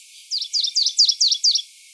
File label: troch Emeishan